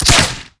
shoot, dartgun; tflippy

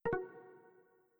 level down

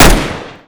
tec9 fire; csgo

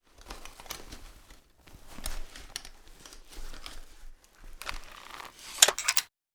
draw admire, weapons crossbow; bms

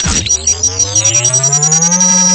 bfg fire begin; aw50; weapons

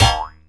banana impact

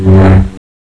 lightsaber swing; weapons

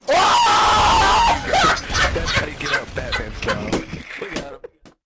runover

